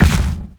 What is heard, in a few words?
L Carn step, Dino